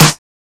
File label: 00s treble heavy acoustic snare d sharp key; snare drum samples